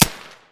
loop, 5.56x45mm; Caliber

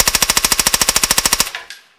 silencer burst; Weapons

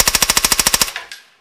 silencer burst

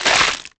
beartrap flesh hit

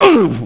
hit; BattleSNDS

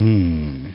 humm; BattleSNDS

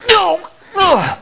DYING; BattleSNDS

